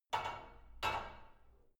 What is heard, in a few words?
barbell only replace unsteady soft; sport freizeit; fitnessstudio